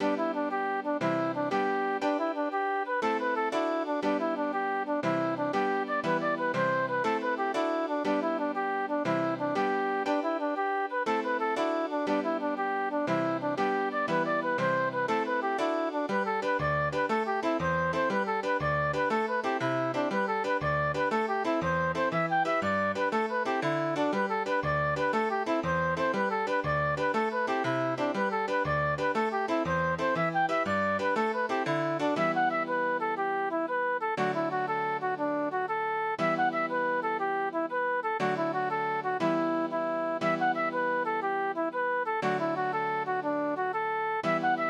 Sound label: slide set